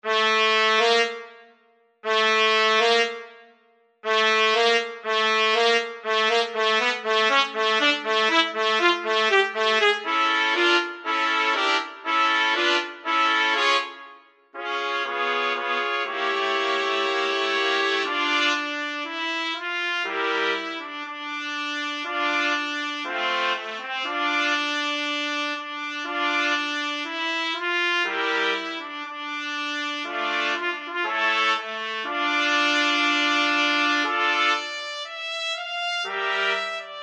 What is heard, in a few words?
dvorak new trumpet quartet